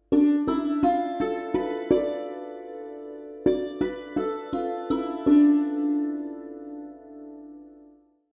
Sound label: Shivranjani; murchanas